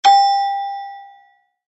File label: Gameshow Bell Ding